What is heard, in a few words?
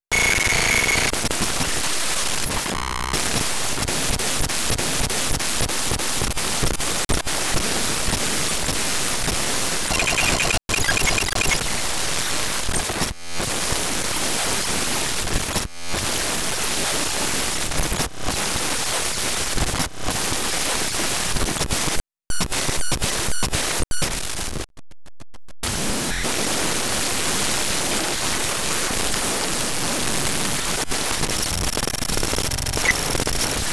Data Moshing Raw; 99Sounds; Mangling Audio; Fonts